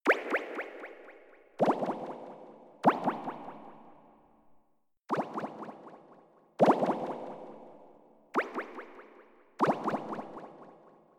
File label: Menu Ambience; Rhythm n Notes